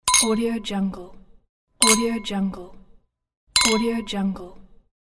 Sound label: glasses champagne; archisound